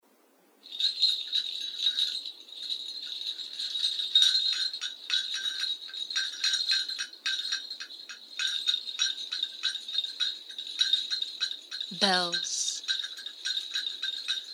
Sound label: bells flute haiku